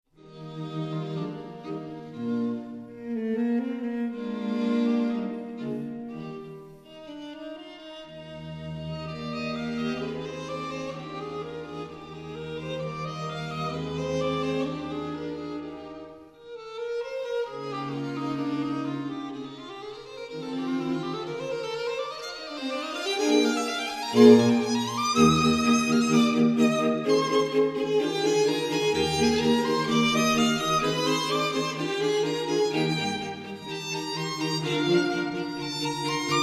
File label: quartet; tuttowitsch